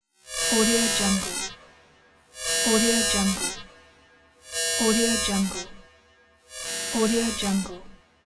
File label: spaceship alarm